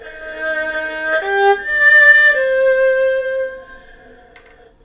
Erhu Fragment

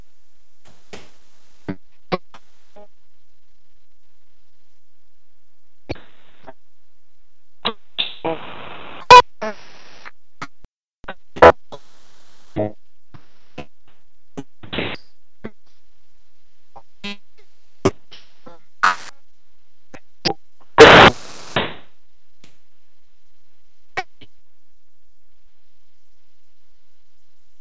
Broken comms

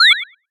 Instant Teleport

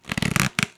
Plastic Squeak

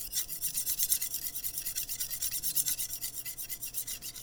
Kitchen

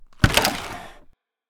Hand Gun Hits Wooden Floor And Slides

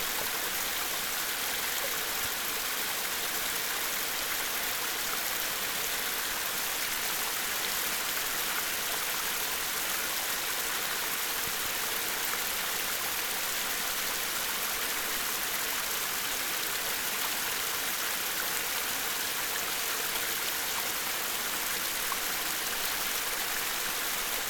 Falls

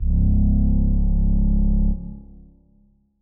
Bass Clarinet

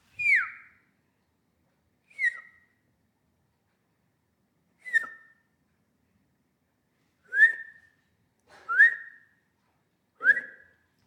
Short Call Whistles

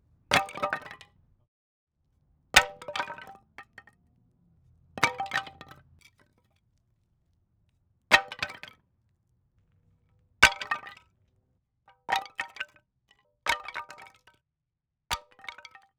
Wooden Planks Dropping On Concrete Breaking Wood

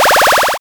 8 Bit Powerup Get Something Big

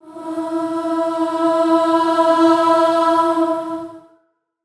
Female Vocal Unisono E

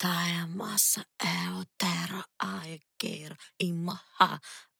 Chant Loop Soft

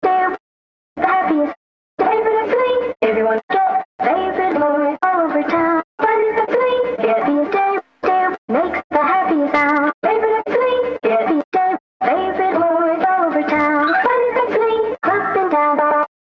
Vintage Vocal Chops

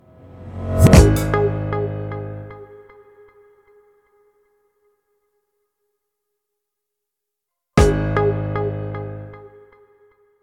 Logo Beat

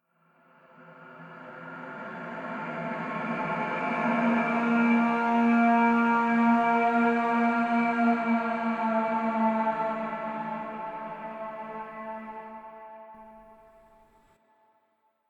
Stinger

